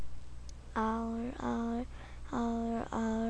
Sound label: owl